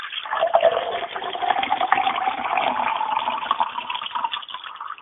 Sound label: pouring Water